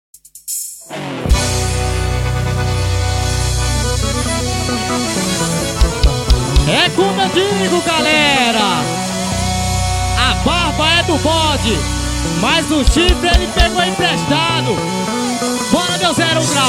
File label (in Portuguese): forro